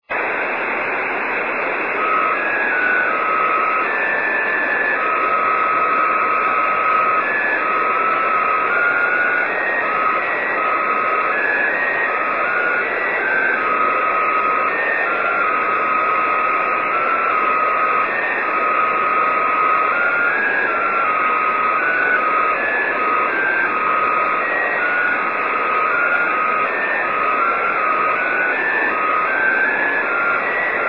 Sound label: 5G jt65c; 曇り、気温25℃ 湿度65%; ANT:45cm offset dish; WSJT JT65C; JH1UGF 横浜市; JN1AYV 茅ヶ崎市